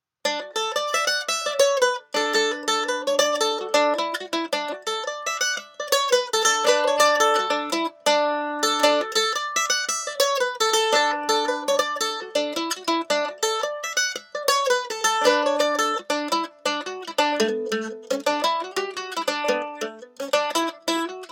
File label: Mandolin Pick Comparison